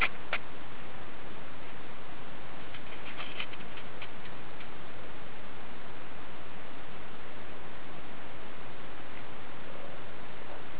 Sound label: growling sound